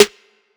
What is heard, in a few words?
Trap Snare; Hard hitting and production-ready snares for Hip Hop and Trap; synthstudiosind; SS Trap-Snare Vol 1